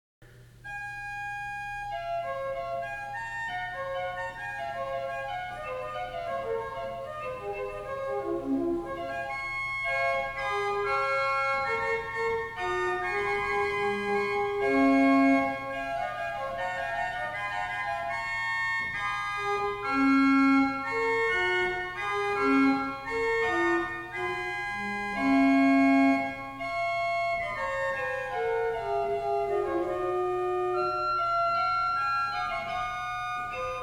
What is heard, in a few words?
improvisation on the Principal Octav 4; Tannenberg Moselem Springs